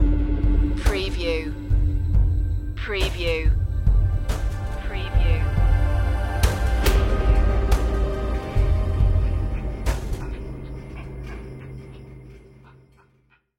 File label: ethnic movements stinger